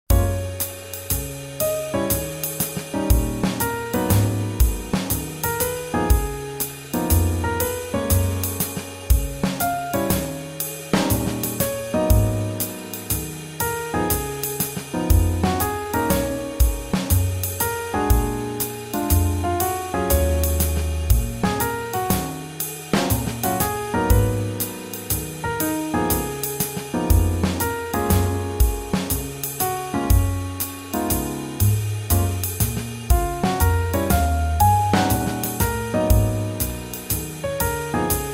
Swing Jazz

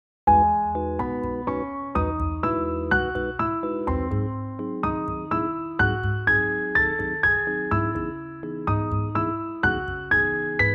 Bossanova; 잔잔

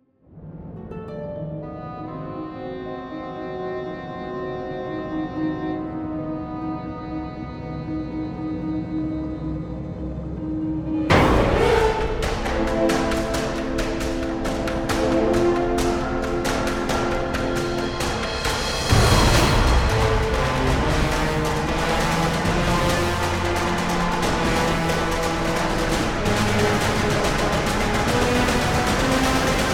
Attack, Tension

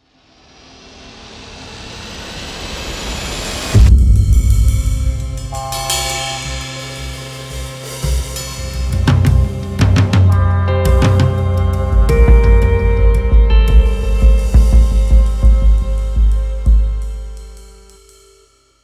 HiddenCam

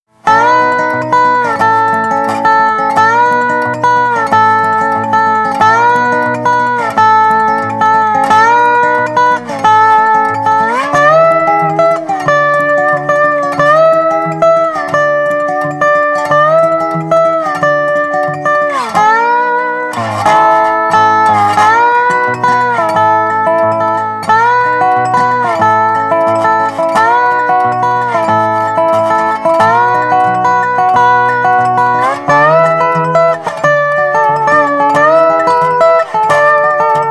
Dobro FX

